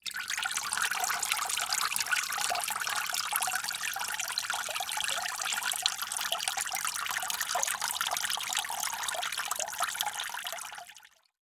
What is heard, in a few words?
Liquid Water Dripping Into Water In Plastic Bucket Fast